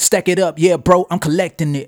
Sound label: new item